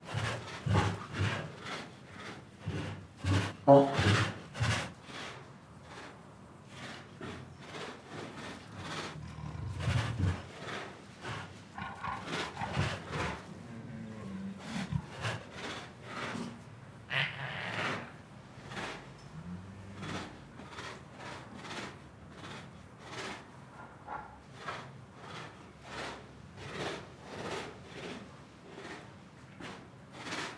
Siberian Tigers Make Many Growls, Sniffs and Snorts; Sound Ideas